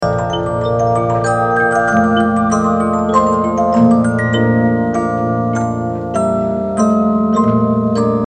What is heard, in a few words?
Polyphon With Base